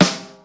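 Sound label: snare ring, Yamaha Motif ES drum machine; 300 Drum Machines; Yamaha Motif ES-Series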